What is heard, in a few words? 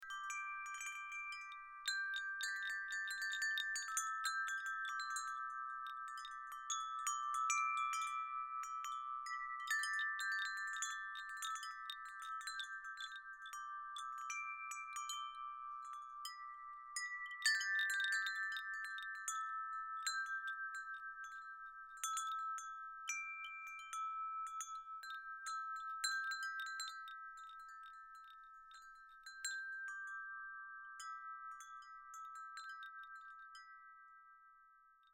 rain, windchimes